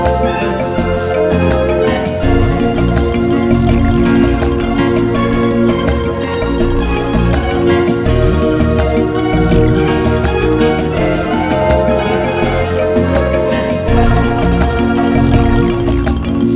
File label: Extraordinary Bossa Nova